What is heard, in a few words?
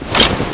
weapon drop